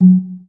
roll; Bombardier

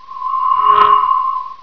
Set Bomb; Escape! (2.1)

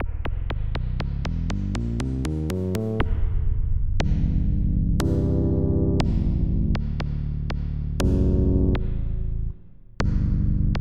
Synth Bass 2; Onj's Soundfont

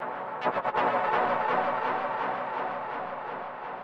SYNTHPAD, HOUSE, 125, A; eJay; Sphere

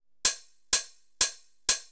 PERCLOOP HOUSE 125; eJay

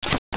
pulldown

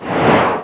mis launch; Cyber Empires; SSI; 8-bit Sampled Voice

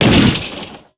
rock hit

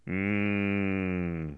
mmmm; Wired for Sound Pro CD; Aristosoft, Inc.; homer

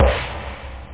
plus rev, snare; da capo vol1; drums

